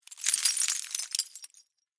Impact Glass Debris; Glass (Sound Effects:Materials)

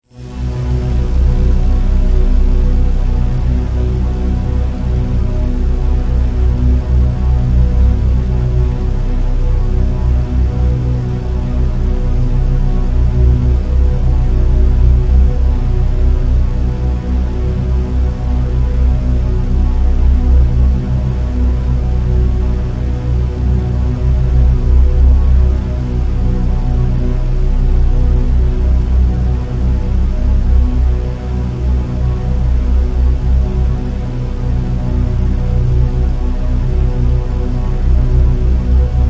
Drone, Synth, Spooky, Horror; 5.1; Sound Effects:Science Fiction; BlastWave

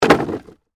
Impact Wood Palette, Drop, On, Palette; Materials; BlastWave

